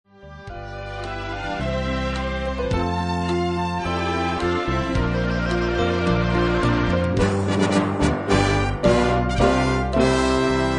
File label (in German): Intrada Playback